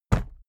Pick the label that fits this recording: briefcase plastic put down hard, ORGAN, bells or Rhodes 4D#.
briefcase plastic put down hard